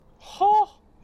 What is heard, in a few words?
hooohh